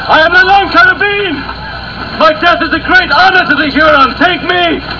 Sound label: carabine